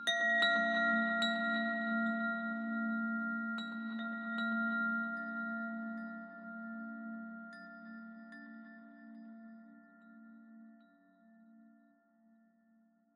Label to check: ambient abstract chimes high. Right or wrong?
Right